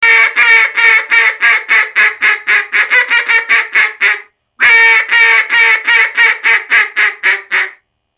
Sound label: Mallard Comeback Call; Duck